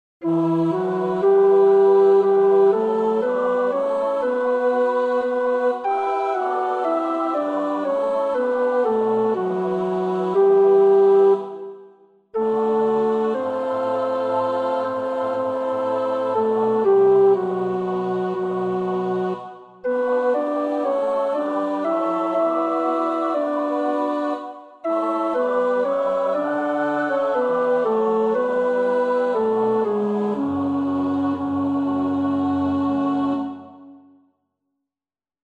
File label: tenor; Klerk (Albert de)